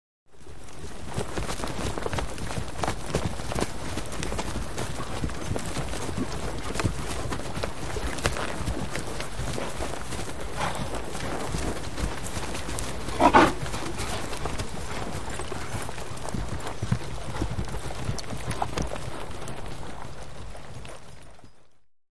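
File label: Cavalry Movement, horse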